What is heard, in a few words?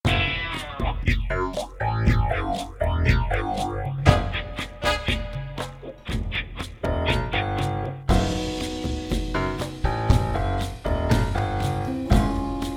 Slow Funky Jail Mystery; JoosTVD; For Your Pleasure Vol1